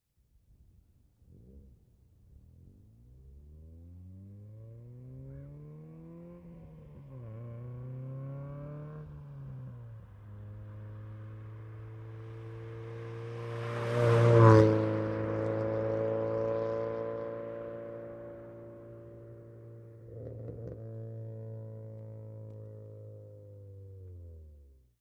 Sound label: Integra, long approach, medium fast by with shift decelerate, Acura Integra